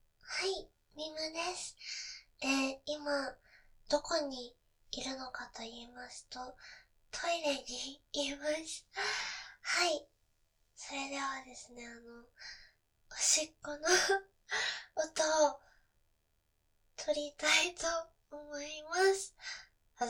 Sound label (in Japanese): トイレ バイノーラル; 未収録